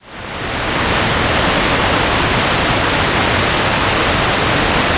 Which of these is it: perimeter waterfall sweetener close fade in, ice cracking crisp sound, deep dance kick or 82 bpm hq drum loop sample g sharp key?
perimeter waterfall sweetener close fade in